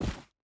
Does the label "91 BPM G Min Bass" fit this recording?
No